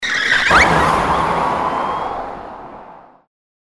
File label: police car leave; Beat the Bobbies 2